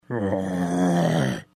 zombie groan; mvm forever

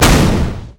howitzer explode; handheldhowitzer

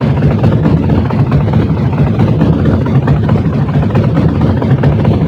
tank loop; mvm

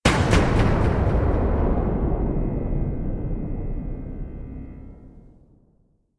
huge wave; mvm forever

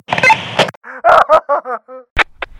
gun spy downgrade; spyvisor

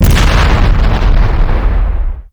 meteor explode, weapons

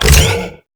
pickup recharge

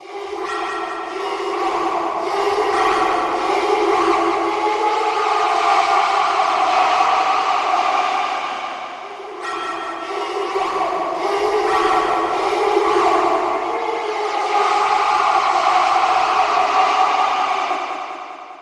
charge; frost; deus volt

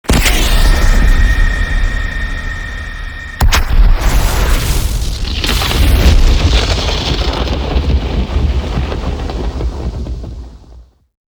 cine explode death; cinematic

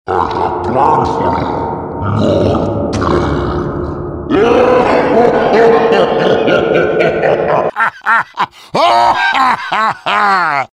heavy laugh

